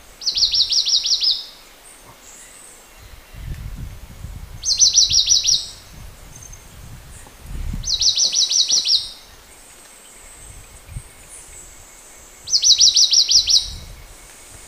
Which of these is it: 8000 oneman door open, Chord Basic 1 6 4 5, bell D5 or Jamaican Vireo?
Jamaican Vireo